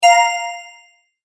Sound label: multimedia tone correct